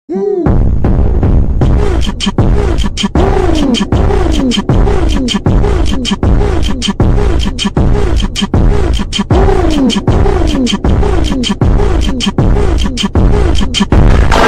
ultra slowed reverb brazilian phonk

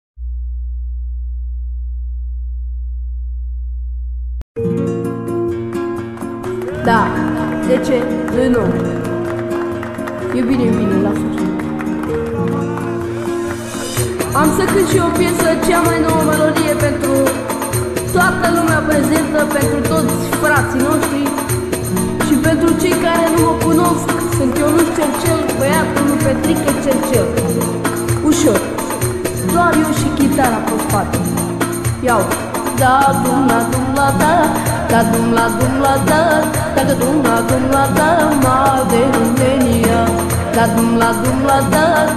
lofi phonk slowed reverb remix

